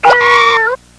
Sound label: cat, Rolled up - Cat; katamari; Sound Effects